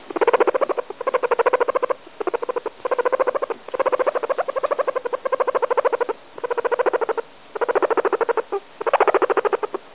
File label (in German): meerschweinchen purren